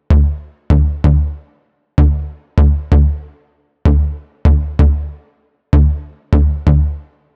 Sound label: Entourage Bass Root D, 128BPM; Vengeance Samples; Entourage Kit